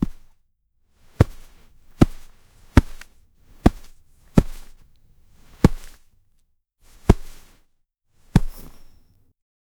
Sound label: a fast soccer ball whoosh